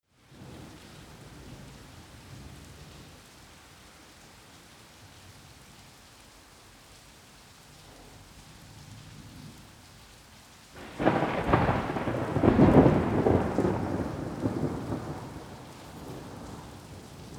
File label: rain and thunder suburb